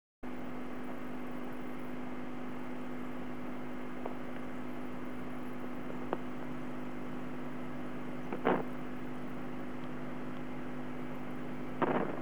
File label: BT Lines AND Tones, STD Line N; Computers Printers Phones; BBC Sound Effects Library